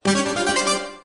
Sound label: win; American Poker The Legends II